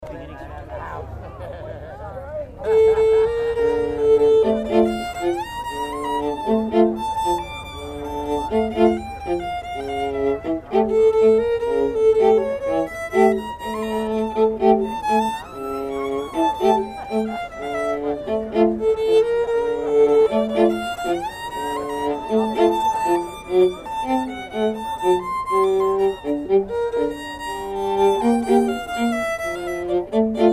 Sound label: Tango